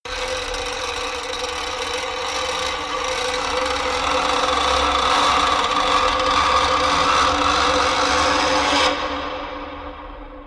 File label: sting; clawed long